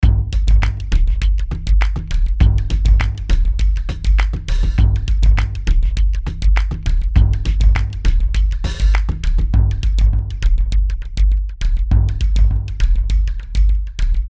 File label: Boom Delay